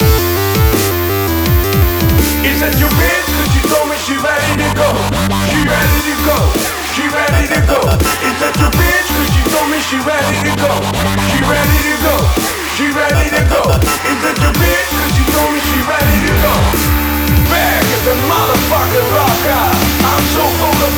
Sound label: Dubstep Intro